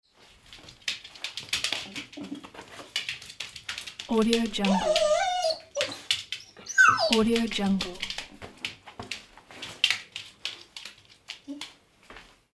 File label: happy doggy